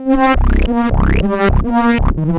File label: Roland C Line, Roland S100